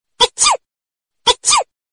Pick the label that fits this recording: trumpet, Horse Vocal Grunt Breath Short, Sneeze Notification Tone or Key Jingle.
Sneeze Notification Tone